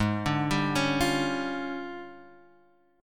D Minor Major 7th Double Flat 5th, G sharp

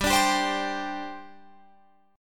G Suspended 4th G down Guitar Open F; Gsus4 strummed

